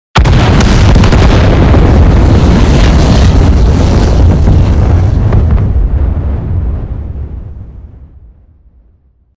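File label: sound of explosion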